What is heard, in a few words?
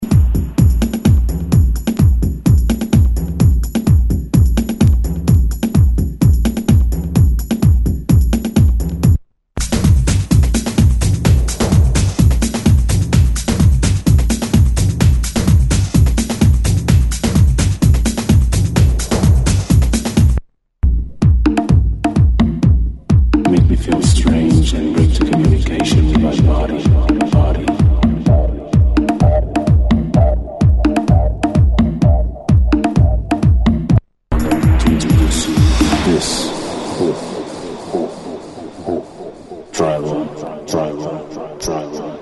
Tribal Groove